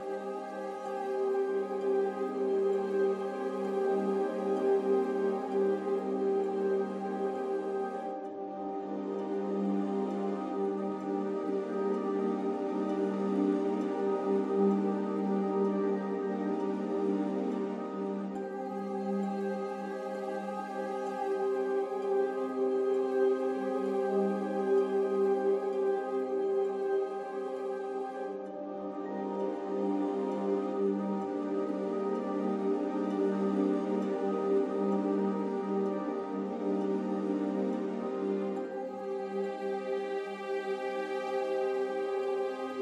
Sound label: Neutral Magical Quick; Cat Interstellar